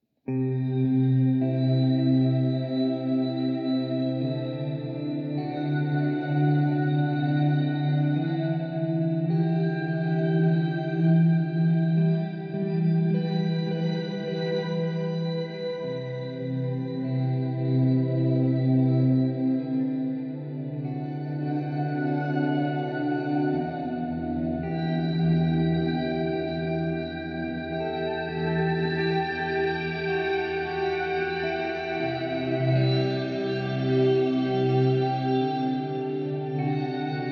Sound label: Ambient piece